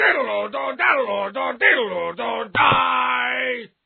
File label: nokia tune